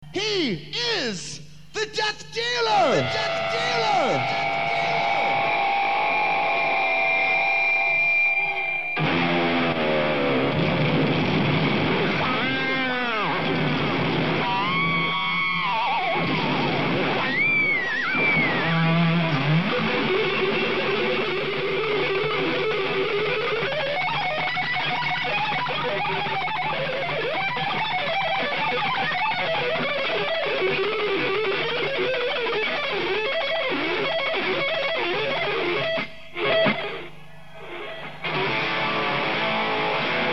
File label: Guitar Solo